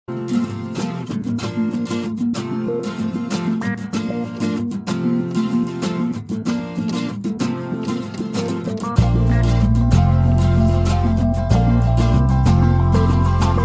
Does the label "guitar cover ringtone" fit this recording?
Yes